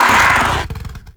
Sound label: Combat Vocal Attack Medium; Warframe; Catbrow